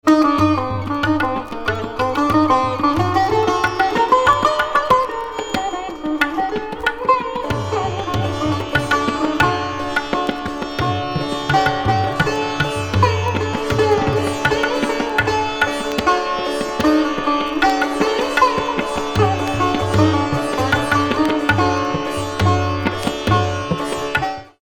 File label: Basant Mukhari